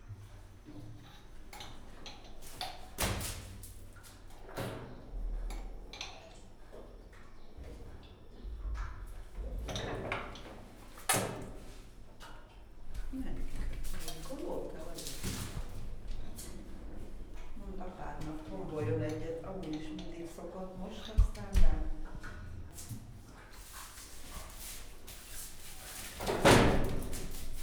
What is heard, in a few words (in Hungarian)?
mézevés mozgolódva egyet köp, csimpánz; gyori zoo 2014